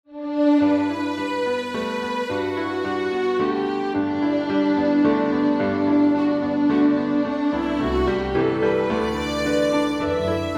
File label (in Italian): Film Romantico - Colonna Romantic; Prova Colonna